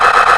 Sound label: GUN; Death from Above